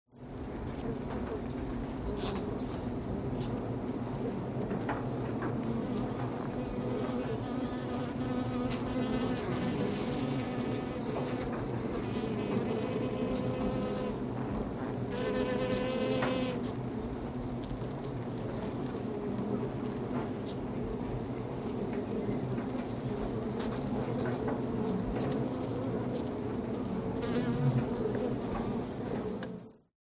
ATMO NATURE Bee Occasional; smartsound

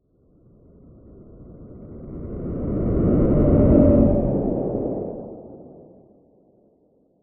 science fiction spacecraft fly by fast

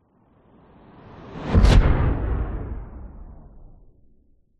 sound design whoosh heavy hard fast impact; zapsplat

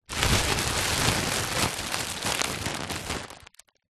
plastic bag roll up by hand; foley

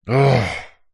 human male grumpy angry frustrated sigh; zapsplat

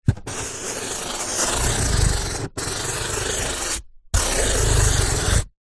office marker strokes on empty cardboard box

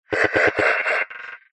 horror evp ghostbox demon laugh glitchy receiver

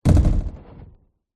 sound design impact designed mid glitch; zapsplat

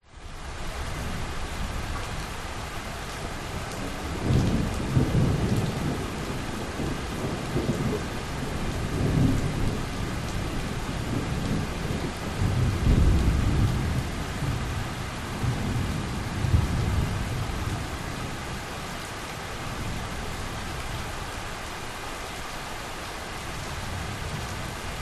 thunderstorm bali rain heavy

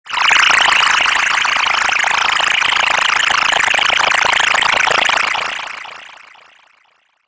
sound design garbled glitch

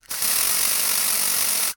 household aerosol can spray; sound effects one

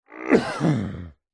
human male man cough into cloth or arm muffled; zapsplat

